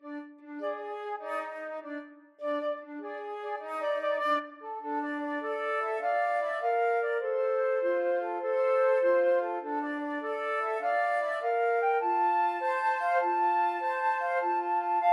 when johnny, flute duet

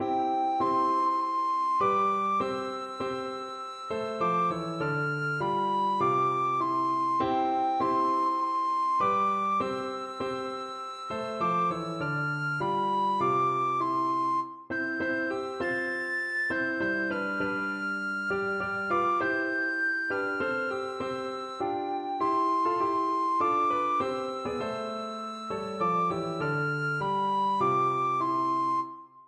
christmas tree recorder

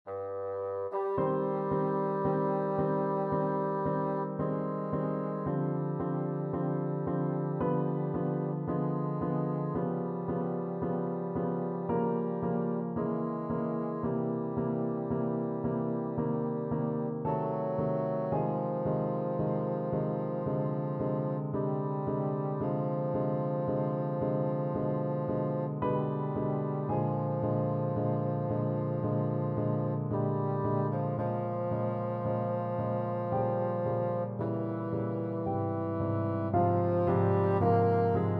chopin emin, bassoon

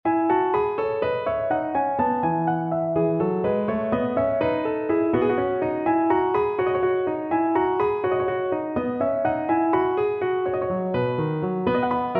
piano

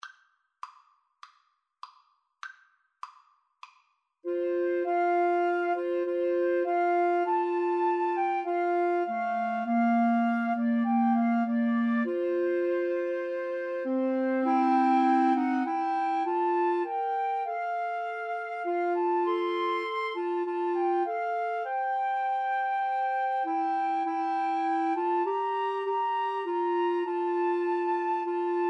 Woodwind Trio; Fischer, William G; fl, ob, cl

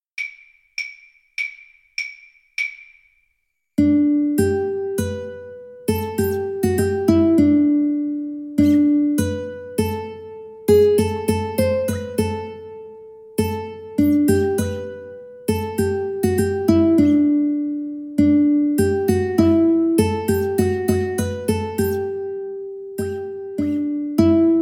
Ukulele duet

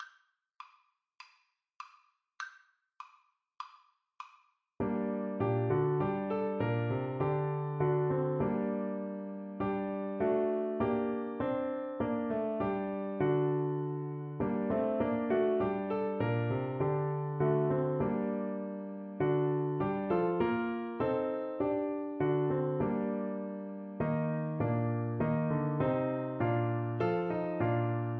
aberystwyth, Clarinet

